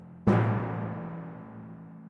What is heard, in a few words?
Tymp